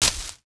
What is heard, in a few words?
bush; Mafie 1